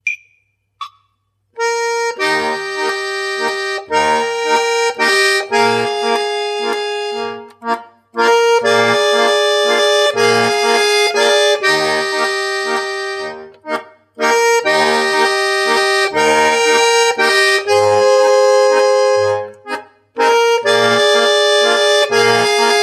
gemuetliches spiel laendler

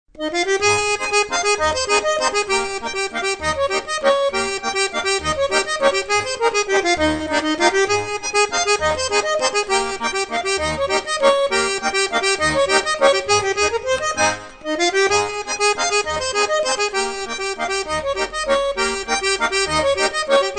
laendler

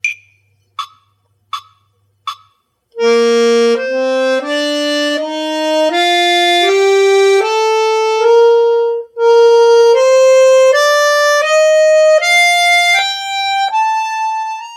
die b dur tonleiter im ziehen und im stossen

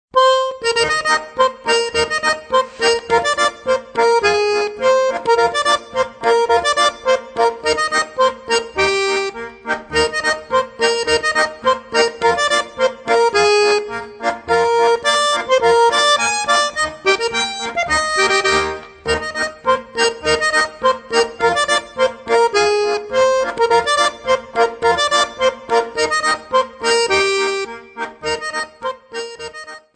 Schottisch

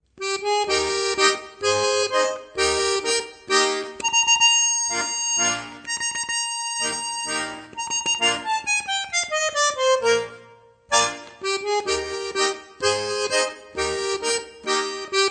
saesselilift polka

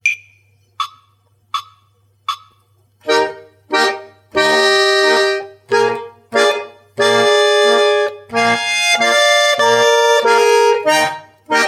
reisefieber marsch

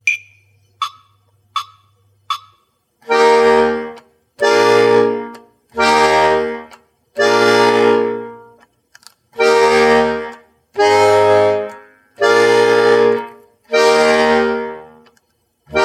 begleiten in es dur kadenz in es dur

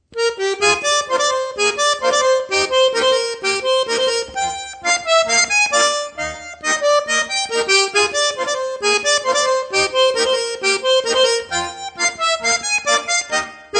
Polka

